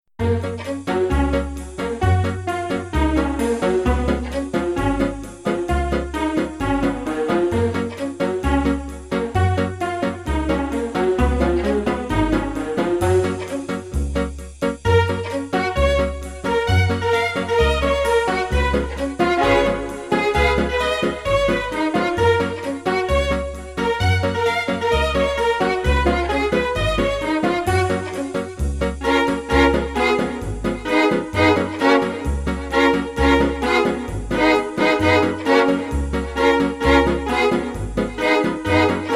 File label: Wheels Strings